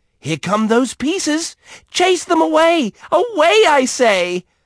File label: Start Game; kids-tetris; Haunted